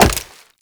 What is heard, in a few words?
Wall Jump Rock; Footsteps; WallRun; Warframe; Characters; Tenno